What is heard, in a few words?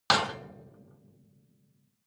metal impact iron ring rattle